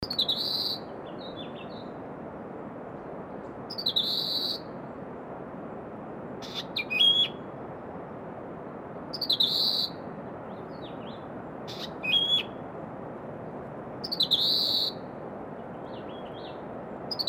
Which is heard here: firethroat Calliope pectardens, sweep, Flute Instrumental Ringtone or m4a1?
firethroat Calliope pectardens